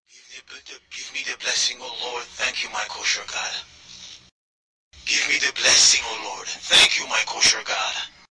jewish prayer

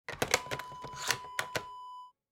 camcorder battery insert

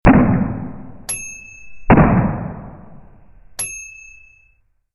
loud shotgun 2 times

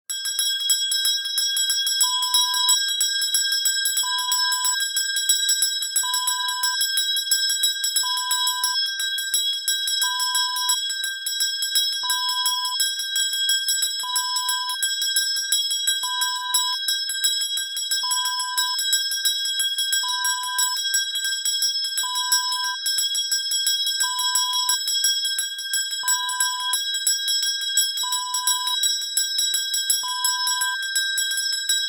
handbell

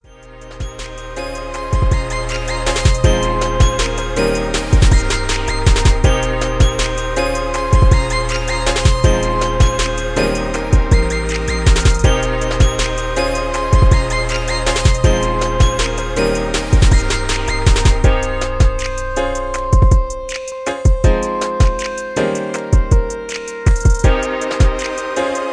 Instrumentals 160bpm

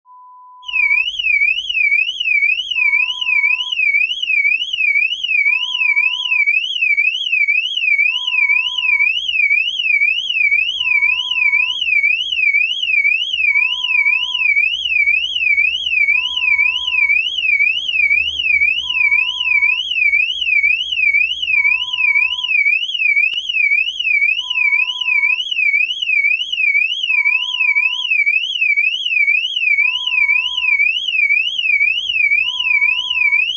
A Car Alarm

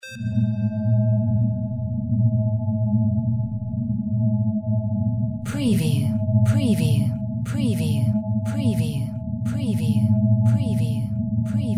DRONE LFE VENT GHOST PULSE FX

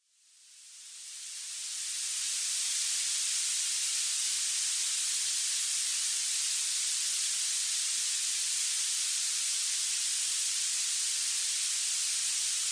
Violet Noise; One Hour

